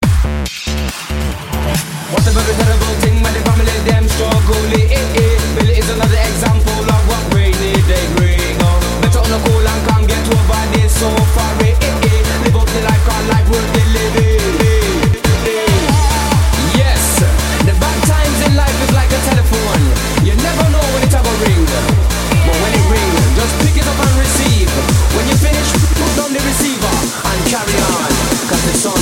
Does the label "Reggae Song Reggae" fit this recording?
Yes